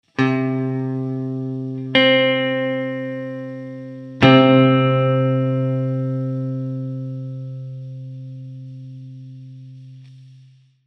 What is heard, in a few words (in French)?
Octaves